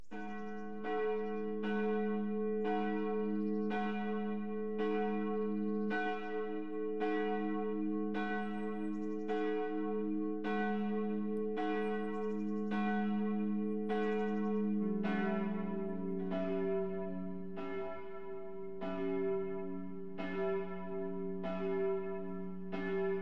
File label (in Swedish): klockor, svenskakyrkan; trefaldighet